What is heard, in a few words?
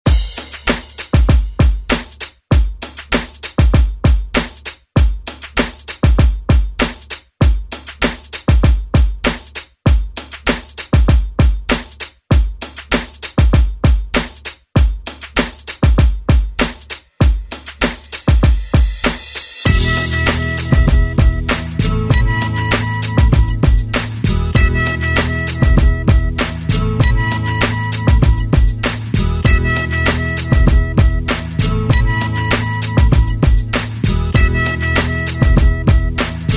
90s ReDrum; DJ Mhark